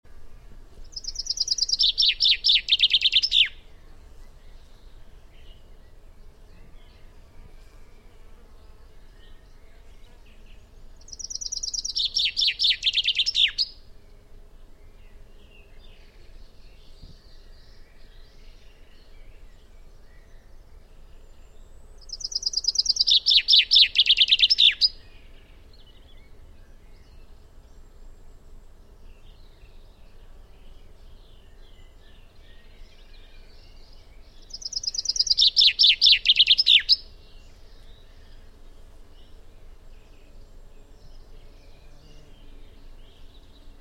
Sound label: Fringilla coelebs; SETE